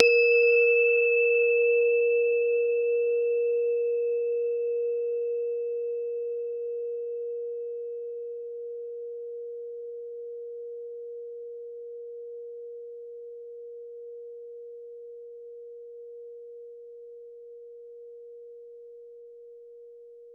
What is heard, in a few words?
single isolated strike